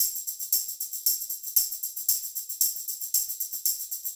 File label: Disco Tambourine, 70s Analog Funk; Sonic Foundry (Sony Creative Software); What it Is! 70s Analog Funk